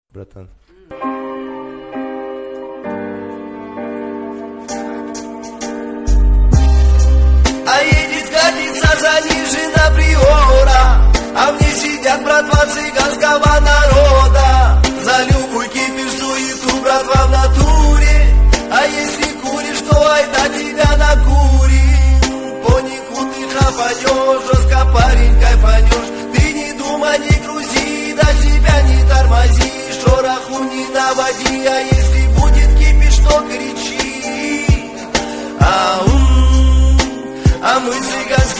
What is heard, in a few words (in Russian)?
Цыганская Песня